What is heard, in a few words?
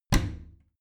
bathroom cabinet warped close heavy